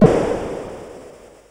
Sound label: sid CSG8580R5; hvsc